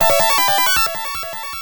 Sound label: All Clear; puyo puyo tsuu; genesis